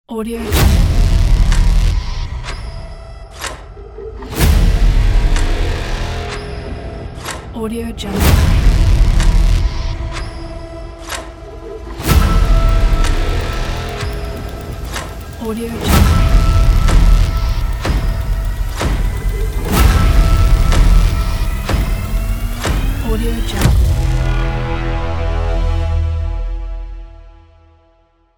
trailer intro ident

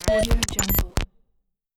glitch

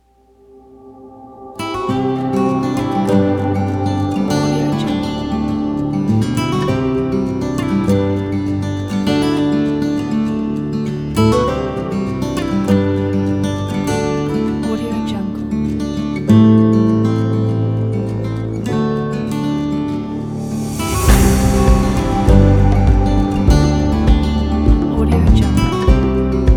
hopeful; lowtone

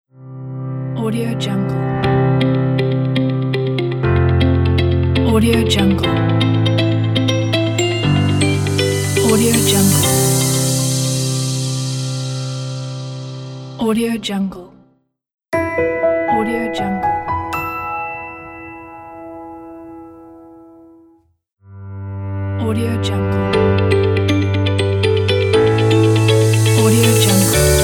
logo minimal pack

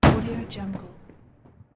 light naval cannon blast

